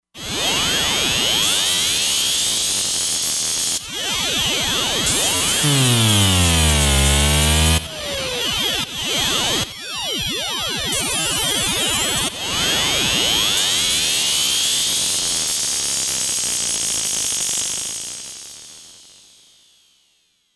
Radiophonik

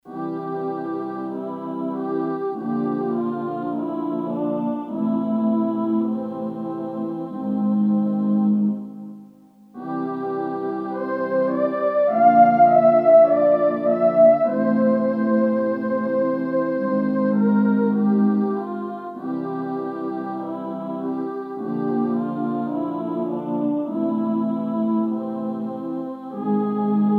DVP, Korg DSS-1, Voices; Voices II

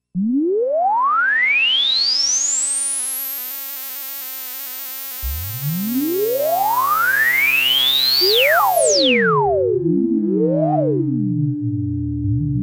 Space Bugs

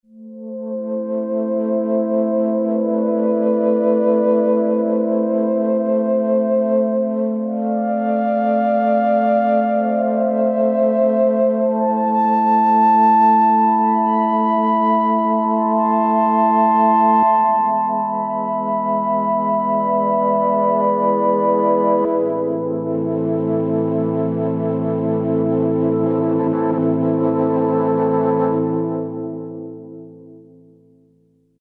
Fluty Pad, Roland V-Synth; Factory Patches

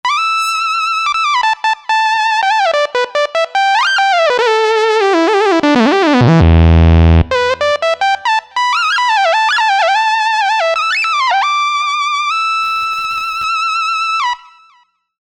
Saw Porta Lead, synth

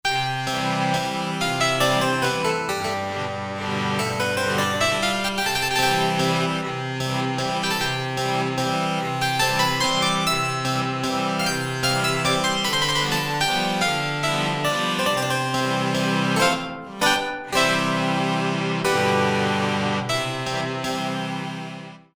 BAROQUE, Korg MSC-6S Fretted Insts; Fretted Insts 1

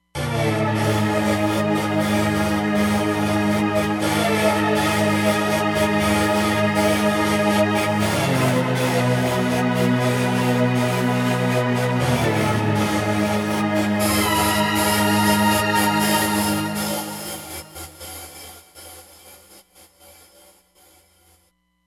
Seq-Pad, Roland Fantom XR

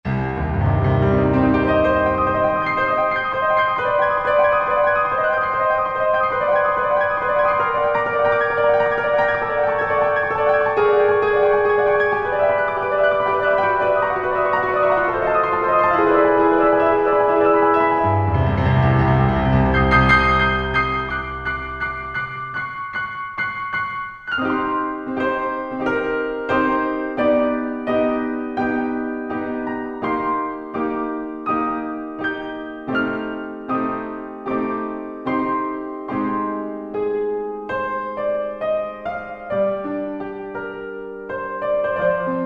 Pop Piano; Roland SR-JV80-03 Piano

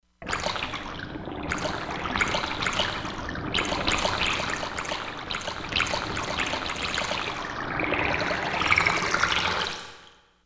Pour Water; Environment

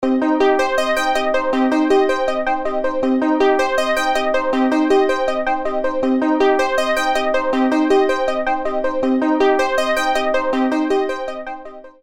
Dance Floor Pattern; Apple GarageBand 2; Apple Loops

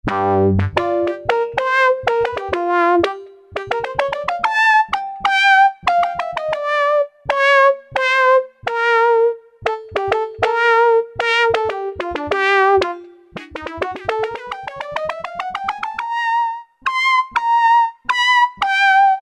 Lead, Kurzweil K2000; Kurzweil K2000 Contemporary ROM; Contemporary ROM Programs and Demos diskette; SYNTHTEX

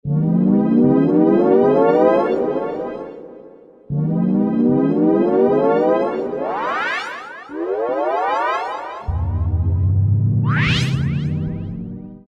Sci-Fi, Roland SR-JV80-06 Dance

